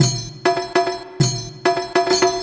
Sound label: Belly Dance Tabla, Acoustic Beats; Sonic Foundry (Sony Creative Software); Myths of Technology